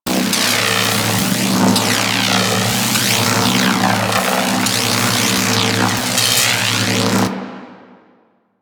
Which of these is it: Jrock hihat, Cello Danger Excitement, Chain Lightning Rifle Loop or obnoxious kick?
Chain Lightning Rifle Loop